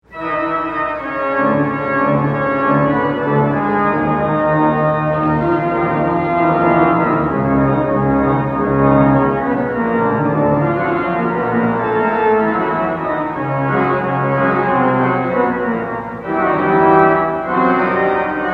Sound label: Organ; Organ Master Works; persichetti